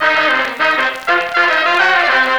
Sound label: Old Scratchy Section, Effected Horn Section; Jazz; Sonic Foundry (Sony Creative Software); Jazz Solos and Sections